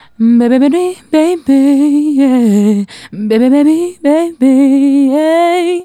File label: Female Solo, Vocal; Sonic Foundry (Sony Creative Software); Vocal Toolbox